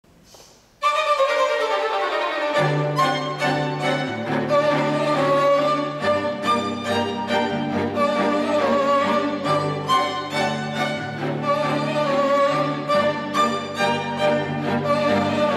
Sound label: Tango